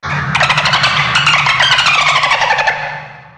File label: dolphin monster sound; zoochosis; lazynap; dolphin deepsea monster